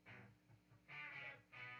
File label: Bass Recording; Sony ACID Pro 6.0